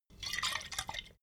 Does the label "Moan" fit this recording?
No